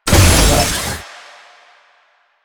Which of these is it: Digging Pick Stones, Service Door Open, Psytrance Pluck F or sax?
Service Door Open